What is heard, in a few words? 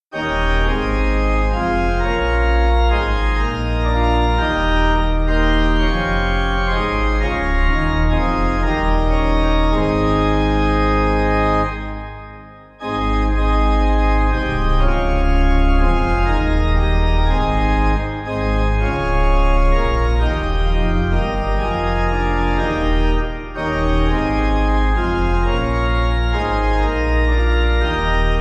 Awake My Heart Selnecker Pipe